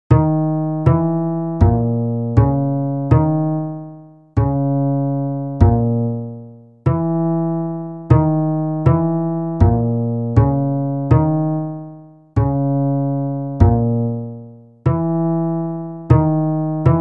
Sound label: Bass block